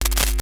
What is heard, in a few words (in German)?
KNISTER, NOISE; Xsample VOL-9